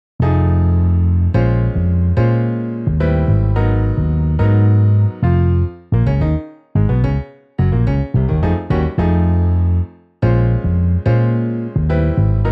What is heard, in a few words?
Piano Bass MIDI